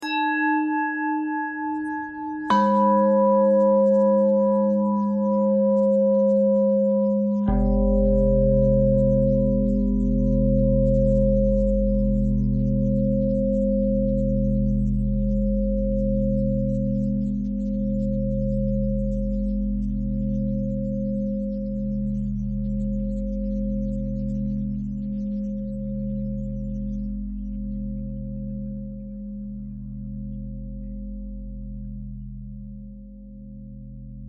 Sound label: bell thread pentatonic